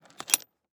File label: hatchet pick up; prosoundfx